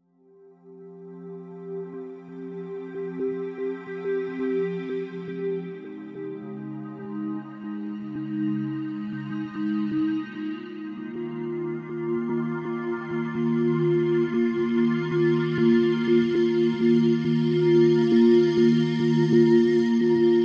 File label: stretch